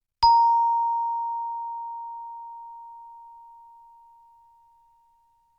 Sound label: Chimebar Bb